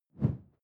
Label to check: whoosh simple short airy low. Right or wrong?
Right